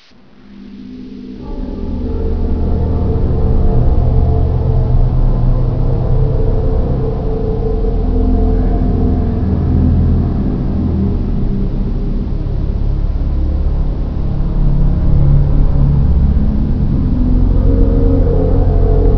suspense; svencoop; kcastle

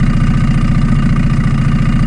generator loop

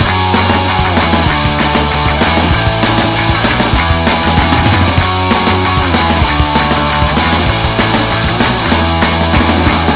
music

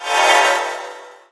trans out; gearbox; klingon

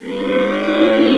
wolt pain; sexr

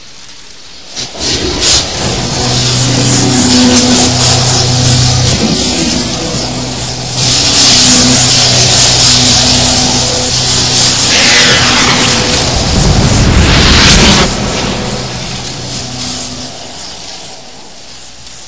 term spark; teleport

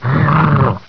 shock trooper die; svencoop

